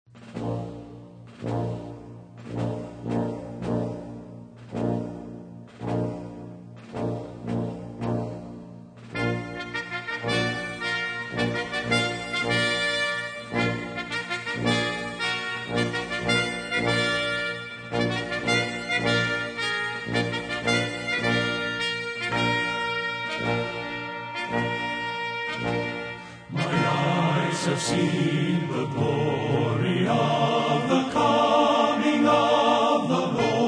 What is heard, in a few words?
Men's Chorus